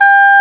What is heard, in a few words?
WND D OBOE, Oboe D'amore